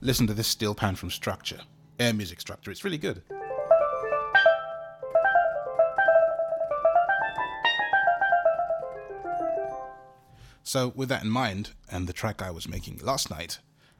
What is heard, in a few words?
A Good Connection - Steel Pans